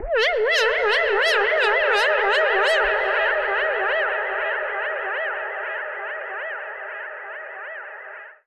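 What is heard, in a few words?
alarm siren, modulation processed, 170bpm; musicradar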